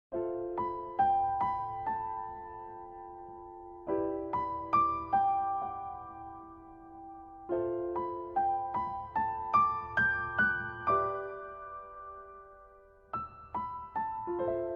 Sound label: promise piano; steins gate ost